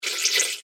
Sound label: Device Movement, Door, Panel, Open, Scifi; Science, Fiction; Tech; BlastWave